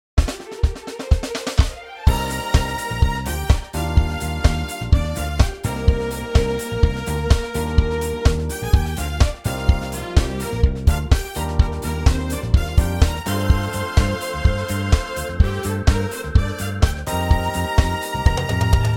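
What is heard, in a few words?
Bb no guitars; key - Bb - vocal range - G to G (optional Bb and C falsettos)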